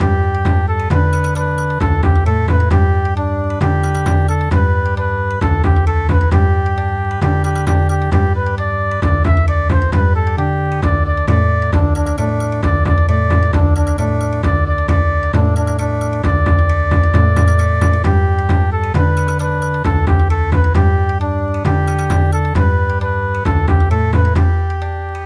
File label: Bon Odori